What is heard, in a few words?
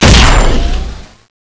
plasma tower shoot; cstrike; DayNightSurvive